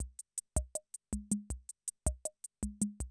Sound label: CR78, DrumLoops